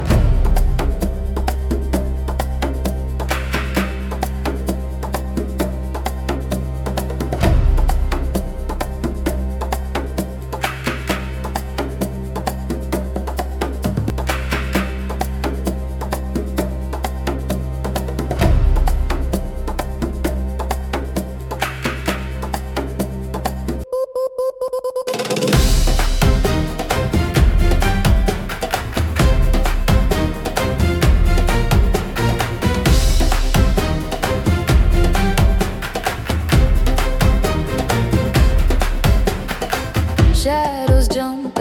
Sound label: low loop